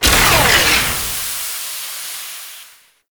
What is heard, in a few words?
Necramech Ranged Overheat; Enemies; Warframe